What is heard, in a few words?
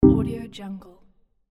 popup message; robotsound